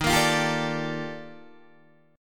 Eb Major Sharp 11th Eb Guitar DADGAD

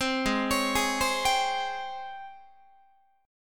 Ab Major 11th Ab Guitar; AbM11 arpeggiated

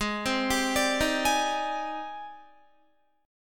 G sharp Major Sharp 11th, Guitar; G#M#11; 15,15,19,19,15,16; arpeggiated

